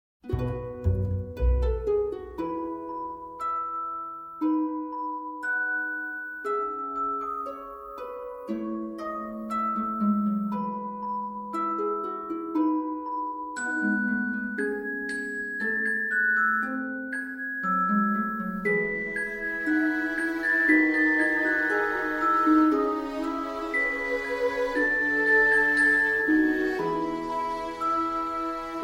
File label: Instrumental